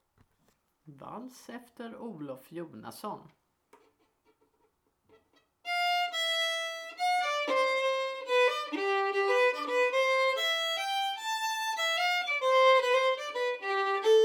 Vals; efter Olof Jonasson

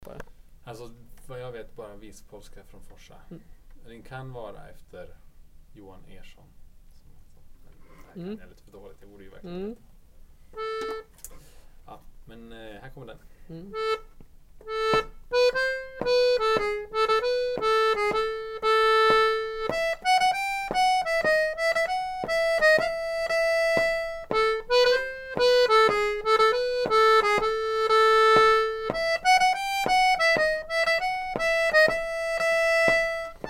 Polska från Forsa

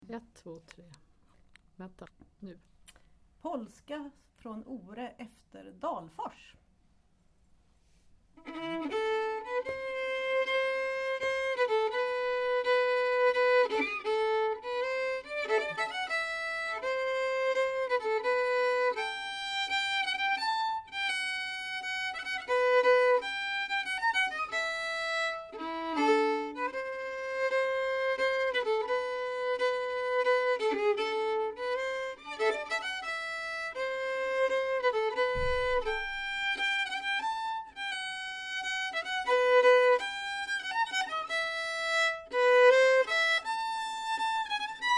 Polska

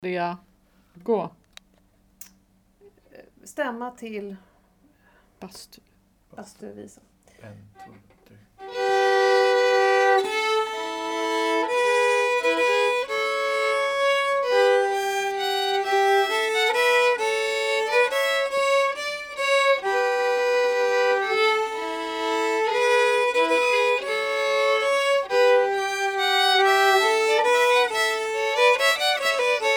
Stämma till Bastuvalsen

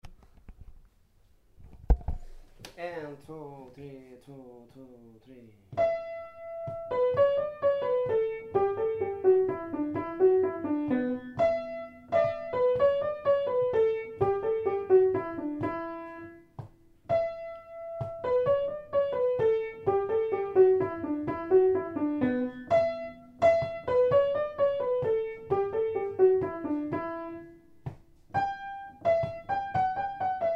Melodi Sakta

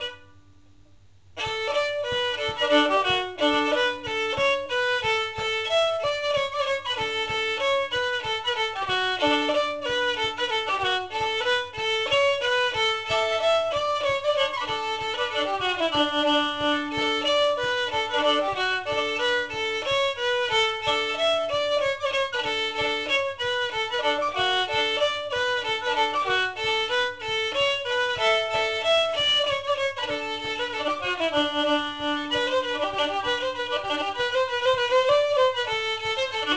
mazurka; Rot Anders

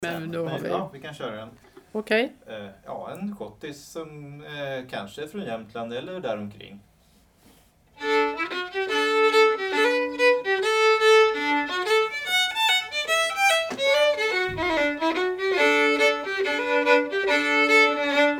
schottis från Jämtland el närliggande

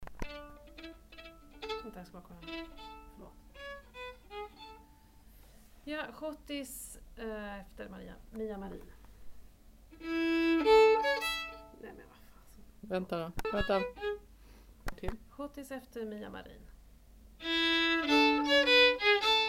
Schottis; Mia Marin

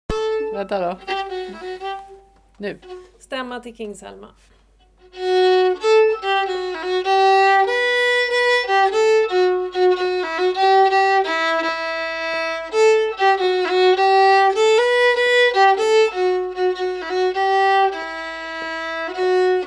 Stämma

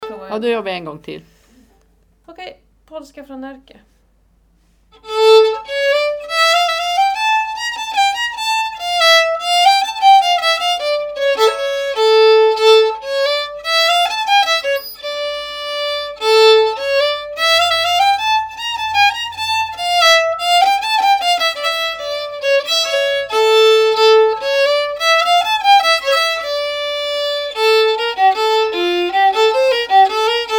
Polska från Närke; Johan Andersson

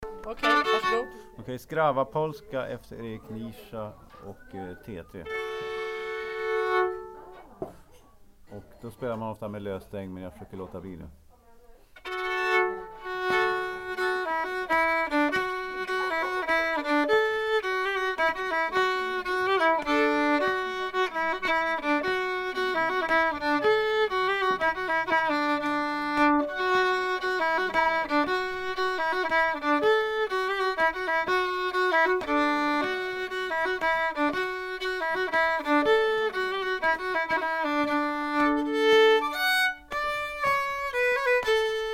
Skravappolska

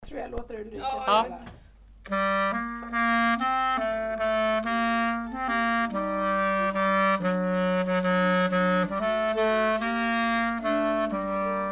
stämma; till bitterljuvheten

